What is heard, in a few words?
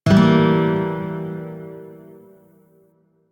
Shawzin Phrygian Chord; Warframe; Lotus Shawzin